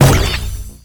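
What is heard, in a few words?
Launch Shroom Projectile Bounce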